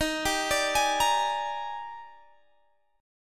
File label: D sharp Major 11th, down, Guitar, Standard; arpeggiated; JGuitar